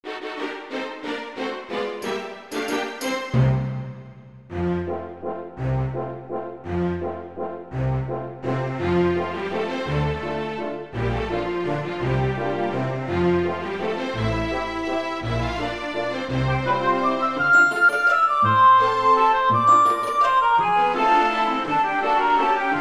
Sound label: Waltz